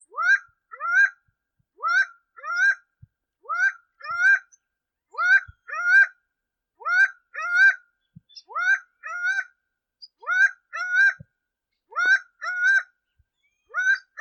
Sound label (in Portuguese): Canto do acaua